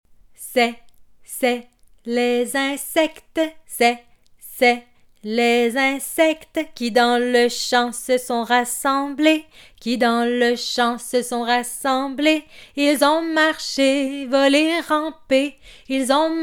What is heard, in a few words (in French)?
chanson cricri famille; collection famille enfant nature; cricri écoute ses amis